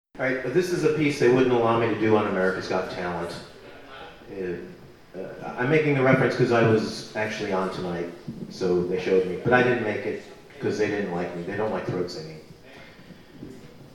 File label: solo for throat and spit stream